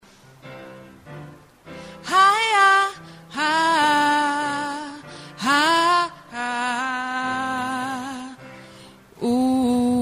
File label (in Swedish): sopran